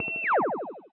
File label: fire